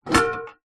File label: rice steamer lid remove; household